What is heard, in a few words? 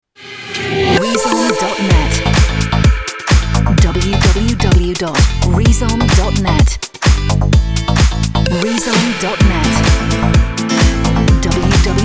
ROCK Dance Pop